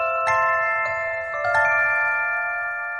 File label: music box; lara croft tomb raider anniversary ost; croft manor